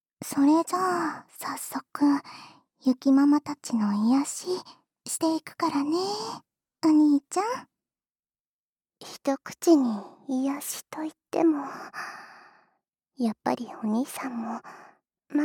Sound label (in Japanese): 双子Ｗ耳舐め, ASMR; 双子Ｗ耳舐め快楽; 音声のみ